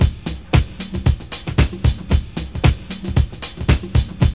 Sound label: DISCO LOOP; Zero-G; Funky Elements